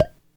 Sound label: pipe Hit; World Of Goo